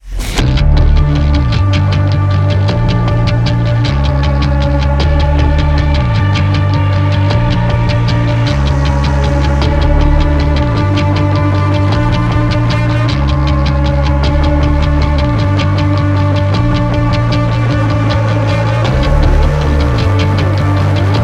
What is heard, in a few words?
break music